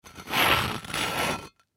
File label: Cinderblock Slide Scrape Rock; Foley; Blastwave